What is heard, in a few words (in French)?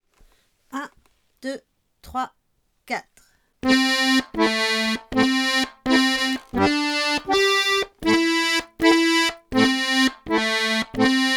rigodon 2 voix lent